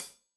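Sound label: hat closed, hi-hat cymbals stereo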